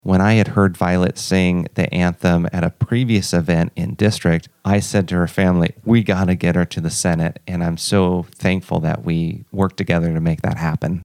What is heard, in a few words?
NATIONAL ANTHEM